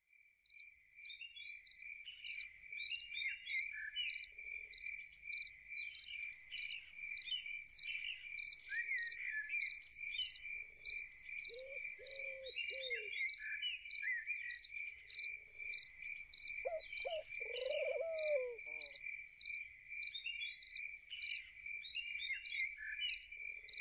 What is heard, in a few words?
Evening Loons